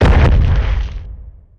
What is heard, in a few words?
Impact